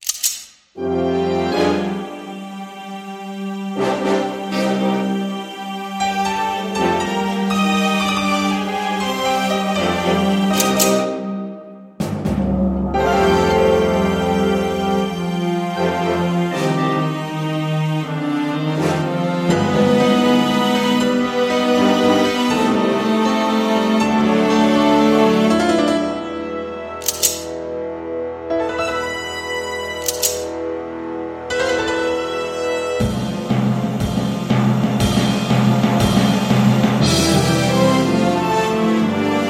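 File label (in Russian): криминальная музыка без слов для фона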